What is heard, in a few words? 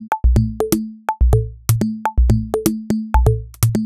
Top A 124bpm, House Drumloops; Zero-G House Fabrik Drumloops